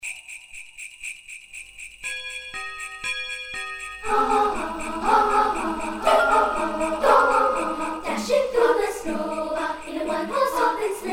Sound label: jingle bells